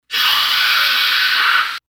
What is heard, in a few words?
The Explosive Shush